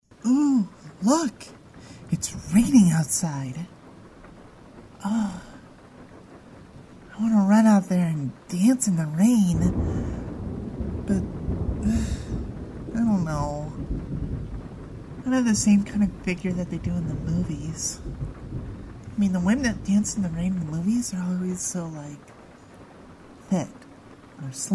Dwindling Rain

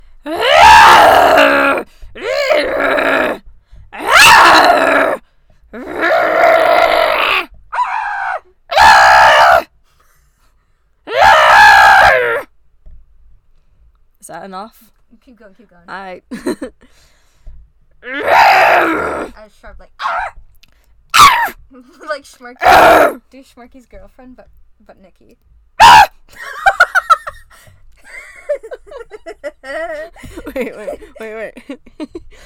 SCREAMING

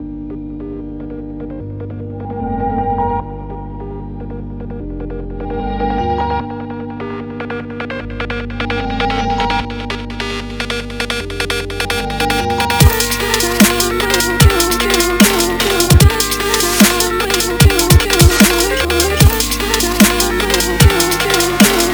dubstep

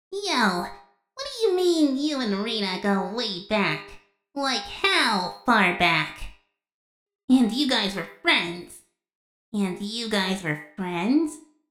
Pearl Drone; RtWHip Lines

